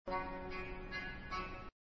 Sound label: G american baroque; MagnaLoops